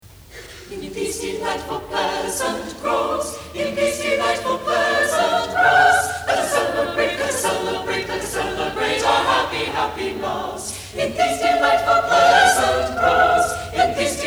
Singers; Renaissance; In These Delightful Pleasant Groves

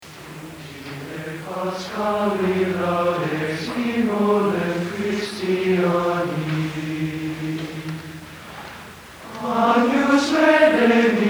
Gregorian Chant; Miami U

